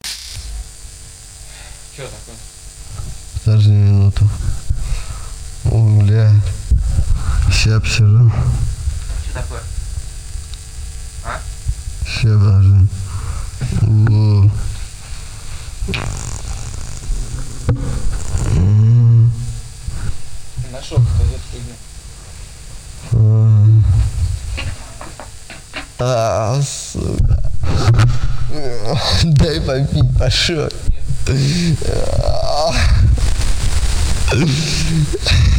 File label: Hardcore Screamo